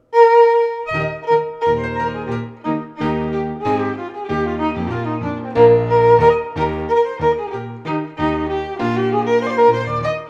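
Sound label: Scottish Fiddling; 2019 Book o Tunes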